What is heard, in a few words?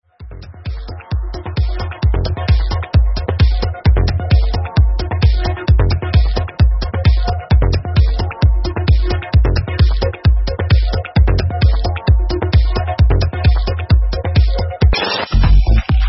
House station